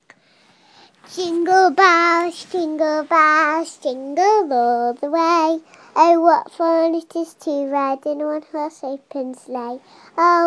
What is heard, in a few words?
small girl sings Jingle Bells